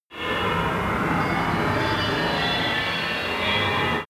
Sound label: giant breathing bell